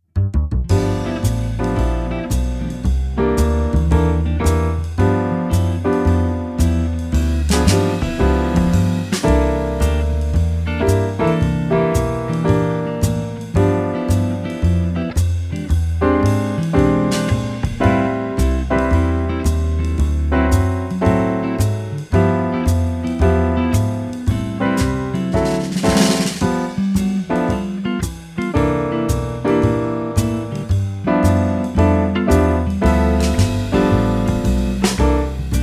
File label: Standard Jazz Blues Playback